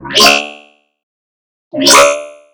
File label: BASS SHOT